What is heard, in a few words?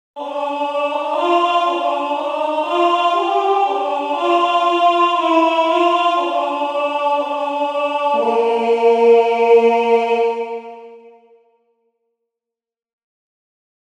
Alto; je ne menge